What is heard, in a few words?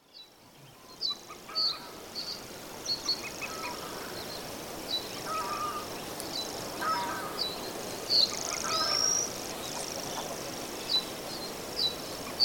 reed bunting and coot